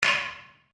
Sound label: Wood On Wood